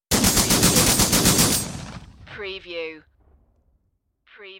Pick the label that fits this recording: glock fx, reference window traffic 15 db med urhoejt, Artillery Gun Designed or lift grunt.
Artillery Gun Designed